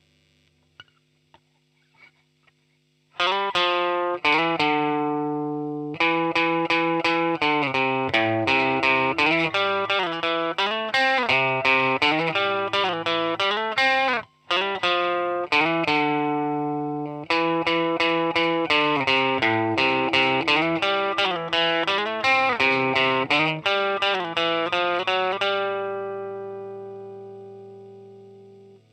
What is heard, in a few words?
Digi Custom Vint